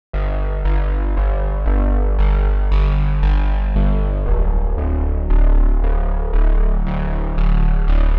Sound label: Synth Aggy Lead C 115bpm, Dark Techno; Touch Loops